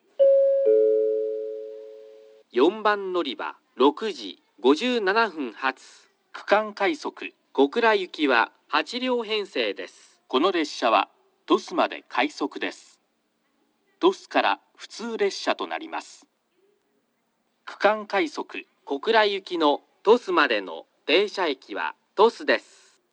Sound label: kurume regional rapid kokura, portable recording; 4番のりば案内放送（区間快速 小倉行き）; ※鳥栖まで快速（久留米限定フレーズ）; →快速区間放送内容が当駅独自のものでした。